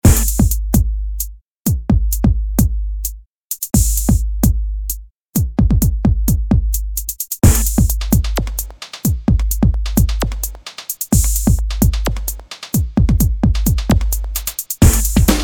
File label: martian breakbeat